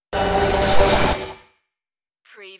starship sliding door

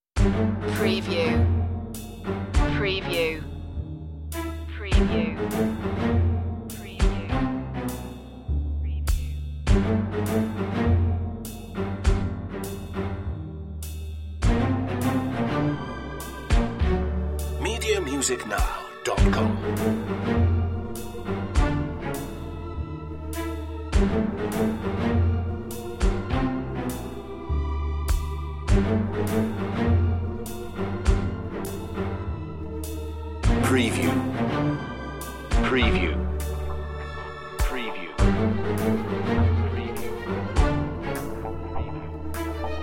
tense shadow

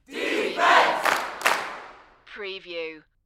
Sports Crowd Chant Defense Single With Clap

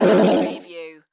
Multimedia Roll Over; sound-effects-bible

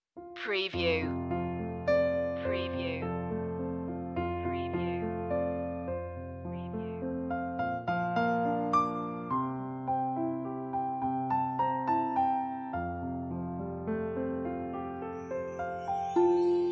orchestral loop intro; the holly and the ivy; media music now

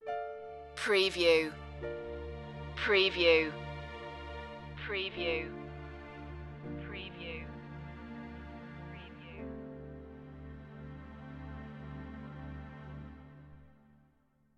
courtroom thriller suspense short

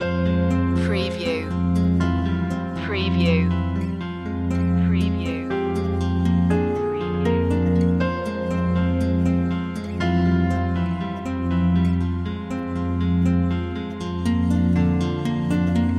cold summer nights loop

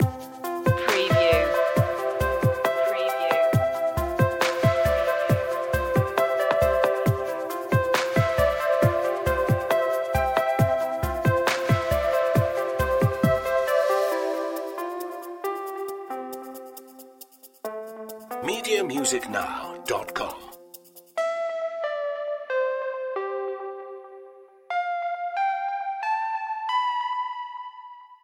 tones of aqua loop

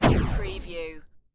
Laser Cannon Single; Sound Effects Bible

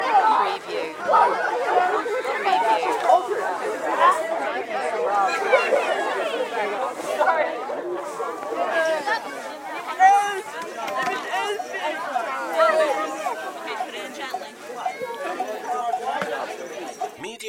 Crwd Outsd

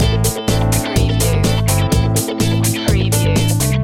disco funk loop

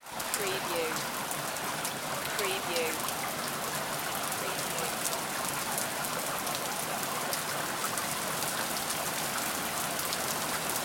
Rain On Street

